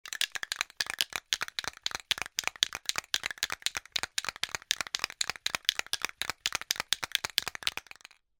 CAN SPRAY PAINT

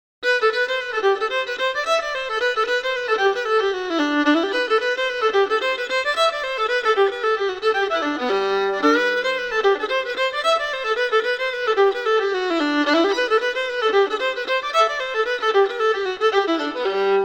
Fiddle Version

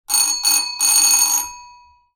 DOOR BELL

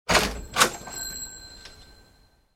DOOR WOOD

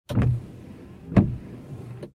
Auto Windshield Wipers Compact Interior Single

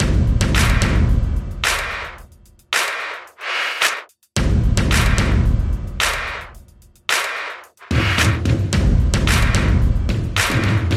Drums Before the Storm

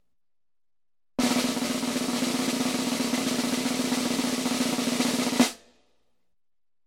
Military Snare Drum Roll

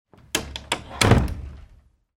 DOOR GLASS

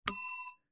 BELL DESK

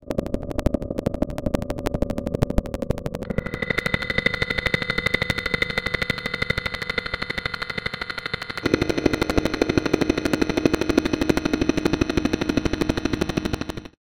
Radio Transmission Hydro Hover Radio Transmission

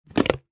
CONTAINER PLASTIC

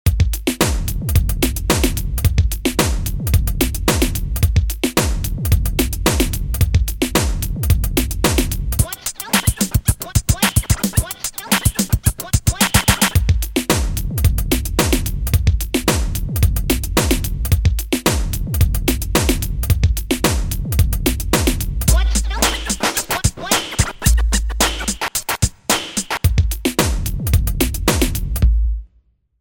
Beat 60

